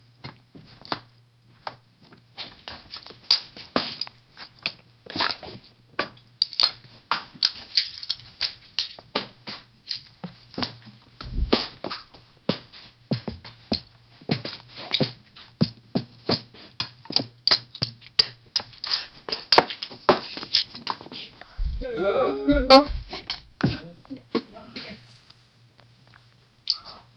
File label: fast footsteps on dry gro